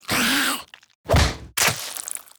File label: leech; Animation Throwdown; cardbabies